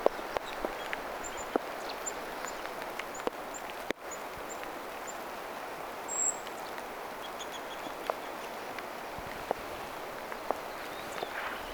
mika laji tuo lopussa oleva aantely

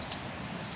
amerikkalaisjunkkon huomioaani hyvin kova aani oli vissiin ihan vieressa ruovikossa

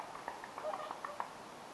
laulujoutsen juoksee veden pinnalla lahtiessaan lentoon

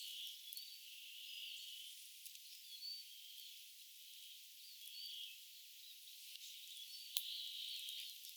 tulii-vihervarpunen

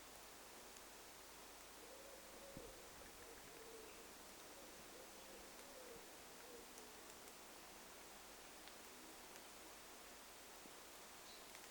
sepelkyykoiras laulaa; jo kolmatta peseuetta olettaisin

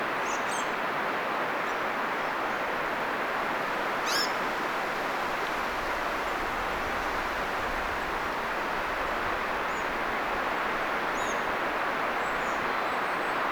rakattirastaslintujen aania

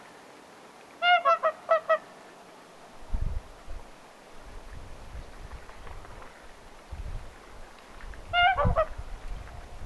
laulujoutsenen aania kaksi

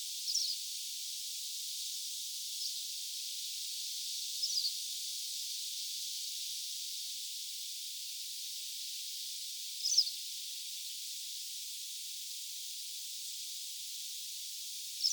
syysvastarakin iloista aantelya

